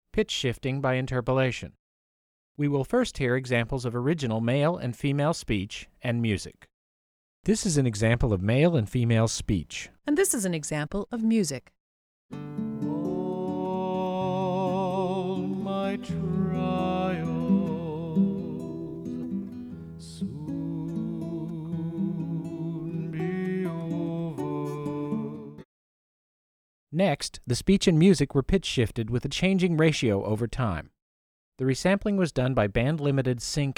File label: Pitch Shifting by Interpolation